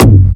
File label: tr 606 synthetic kick drum g key, Royality free tr 606 kick sample tuned to the G note.